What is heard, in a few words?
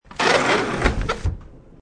Slide Door Close Slow, Sliding door closes slowly.; Doors